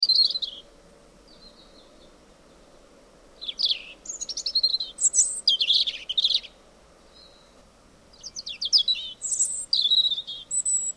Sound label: robin; germany deutschland